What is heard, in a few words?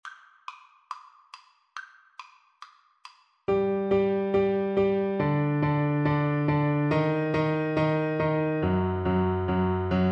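mozart sym, marimba; Percussion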